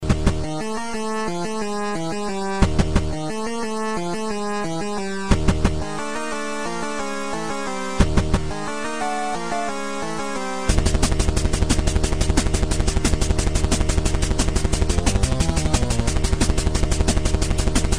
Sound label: 8bit